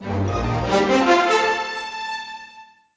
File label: Winfare; Cranky